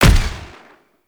Crew Ship Turret Fire 3D; Warframe; RailJack

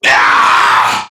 Death Crewmen; Warframe; CorpusCrewmen; CrewmanA

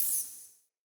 Vocal Impact, Animals; Player; Player Floppet

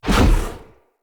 Wolf Claw Dash Whoosh; Powers; Idol; Orengall

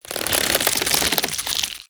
Infested Nunchuck Finisher Tension; Warframe; weapons

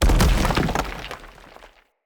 Stag Gallop Charge Vegetation, Footsteps; Animals; Foley

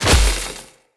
Spider Wire Impact, Powers

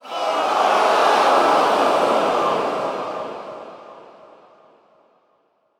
Point Missed Crowd, Lunaro Crowd

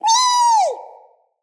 Trapped Sprout Falling Vocal; Friendly; Sproutfolk; Fey Hub Sprout

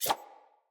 Dagger Whoosh; Sinecure Dagger

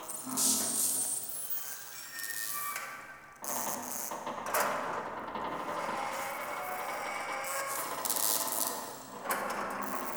Destroyed Class Room Amb Loop; Warframe; The New War; Zariman

